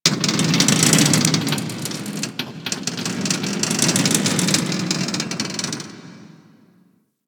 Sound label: Ocean Close Metal Creaks; Ambience; Warframe; Grineer Ocean